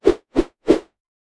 ten fast rising whooshes

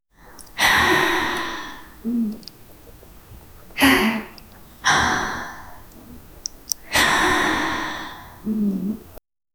sip satisfied drinking

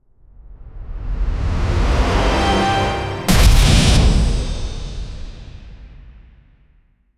epic snappy reveal sting

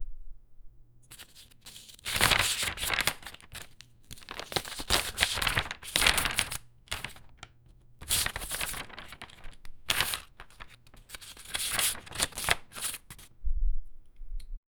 paper money being taken o

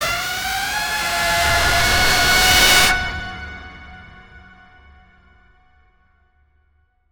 jump scare sting

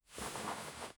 fabric

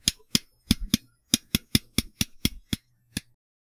light thumping sounds

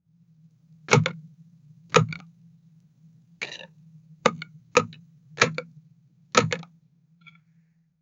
collection sound

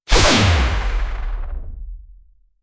a powerful sci fi energy, casting

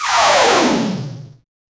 magic missile in a rpg; arcane

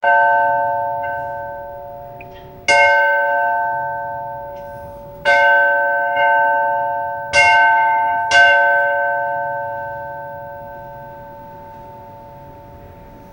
ukrainian church bells

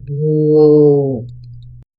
fading mournful howl spirit; dissipating

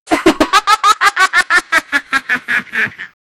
laugh; joker from dc movie; animation

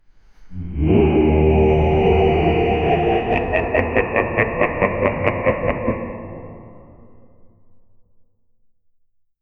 vocals

